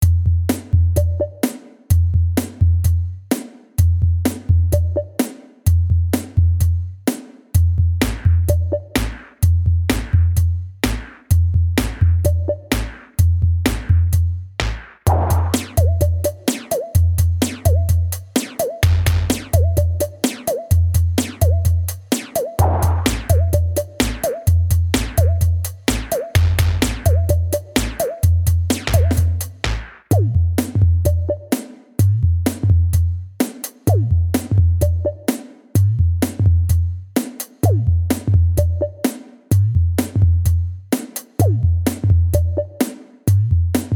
drums

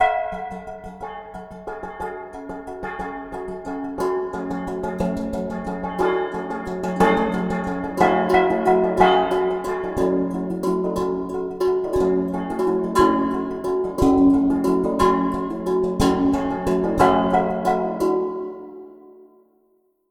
tin ensemble

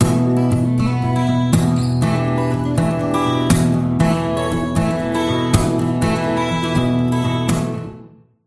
echo chamber plate reverb

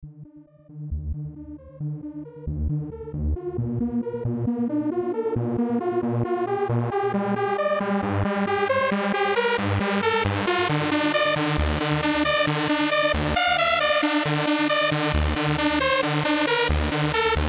polyvoks

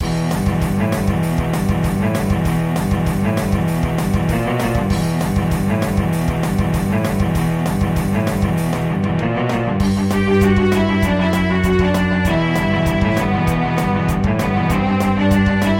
cello bowed

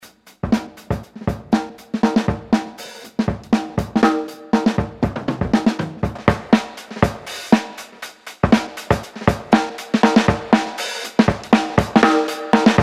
changing params

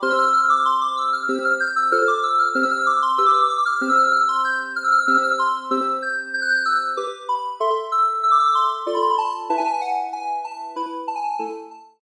triangular wave shape

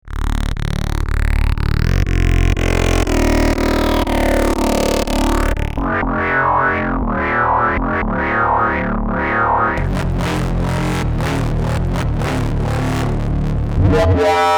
bass; wiggle presets

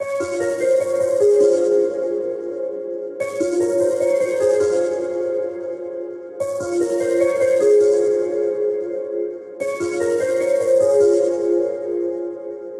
keys patch

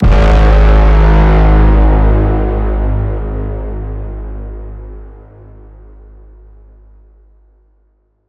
braam classic satellite; aera

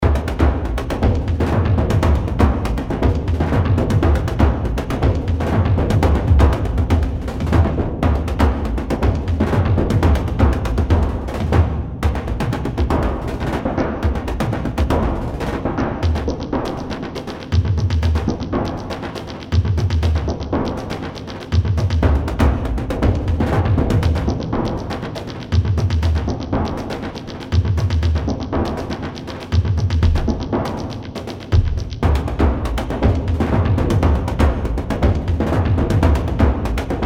stix drums of war

